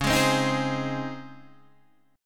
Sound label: Db Major 7th Suspended 2nd, Guitar, Standard; 9,11,10,8,9,8; strummed; chord shape